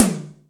AC Tom H, Acoustic Tom; Yamaha DTXpress

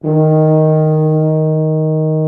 Orchestral Brass

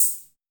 S 78 Chh, drum machine; 300 Drum Machines; DrBöhm

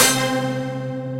VFX-HIT; Kid Nepro - Elite

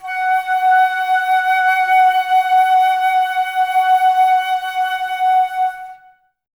Flute, Woodwind; Miroslav Vitous - Woodwind Brass Ensemble

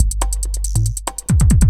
Beat, Drums, Eclectic Electronica

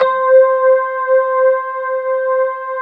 FENDER C4 L, Keyboards Old School; USB Soundscan vol.09; AKAI

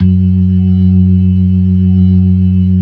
LO MAN V R, ORGAN LOWER MANUAL

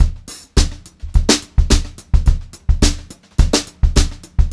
beat, Brushed Drum Loops

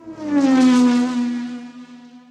Speedy, cartoon Foley Fx; musicradar; cartoon caper

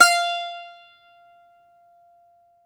Greek Bazouki, Plucked Strings

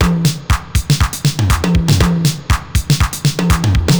Spring Verb Compressed TR 505 120BPM

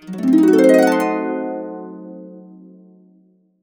C DIM7, Harps; USB Soundscan vol.62; Complete Harps; AKAI

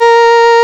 REED A#; Big Fish Audio; Synclav FM

